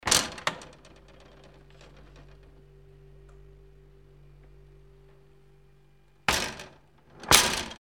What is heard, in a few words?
door kitchen open and close